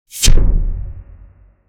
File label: laser sword ele; burst; star wars